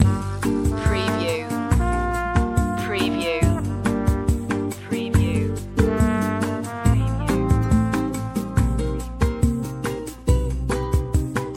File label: yoga bossa loop